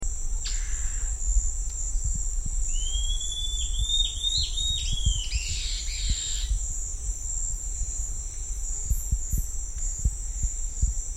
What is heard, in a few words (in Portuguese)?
canto da mae de taoca cabecuda